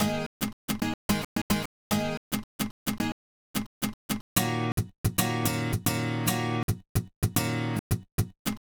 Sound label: Pop Ac-Guitar Cut Fmin; 110; Pop Rock; Acoustic Guitar; Pro Tools Essential Loops; Digidesign